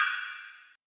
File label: long ride sound sample e key, Royality free ride cymbal tuned to the E note